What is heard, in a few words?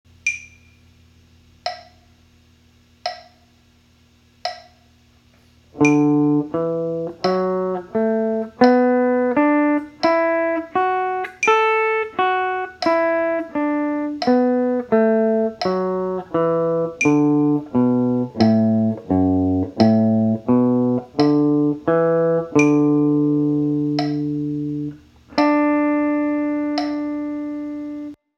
CAGED